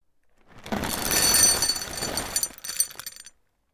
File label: Put the coffee beans into the coffee grinder